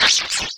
Metals Fx; Sonic Foundry (Sony Creative Software); Esoterik Beatz

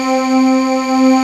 VOICE JD800; Best Service Gigapack 1

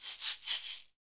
SHAKER, AFRICAN PERC; Roland - LA Composer vol. 1

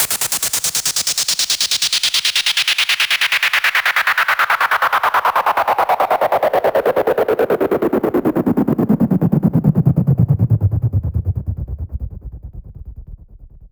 Sweeps Down; Effects; Vengeance; Essential Clubsounds Vol.4

